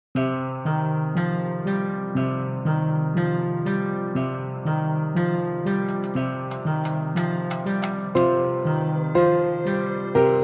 (Melody); Crimson Bamboo Forest

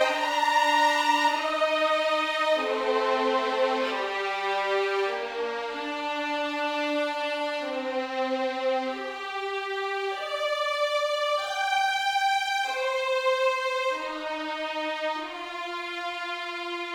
Song Loop

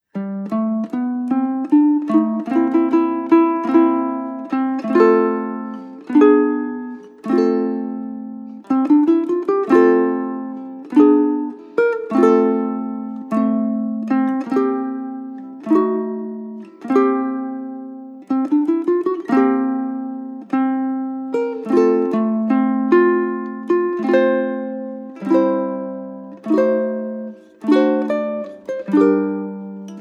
Underwater BGM, ukulele

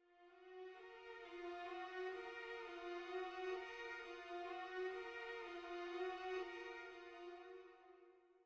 Combat Lite Mood

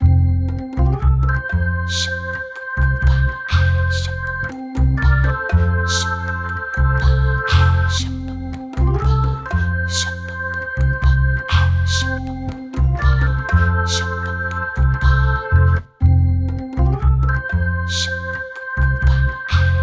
Cutscene; Jungle Joint; Cooking Dash

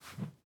tools sledge

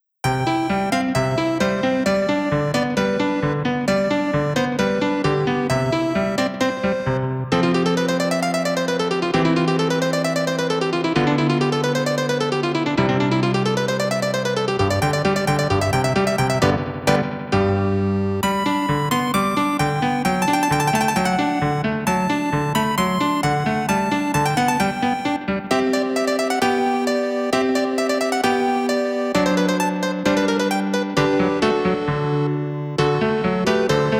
Piano Lesson; Tales of Phantasia 20th Anniversary Sound Box; SFC

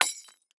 glass hit

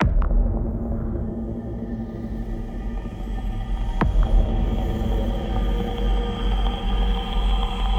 countdown 120bpm intro

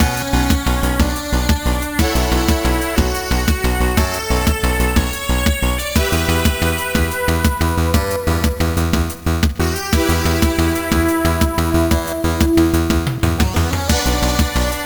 Easter island Loop; high strangeness; wii u

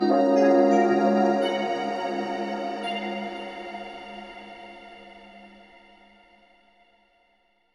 Retry Jingle; Friday Night Funkin Mario's Madness

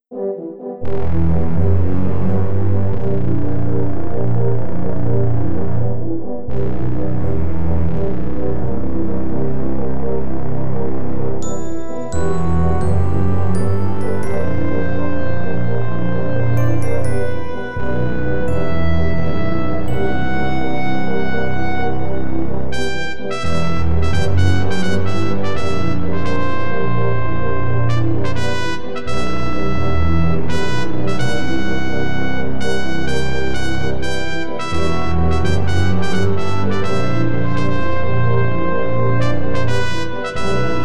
Countdown; Space Quest IV reorchestrated; Blue Frogs

